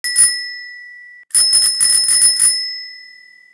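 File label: bicycle bell; Smeshariki Cartoon Studio; Velosiped Zvonok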